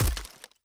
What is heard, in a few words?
Stalactite Impact